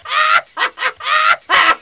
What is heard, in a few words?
LAUGH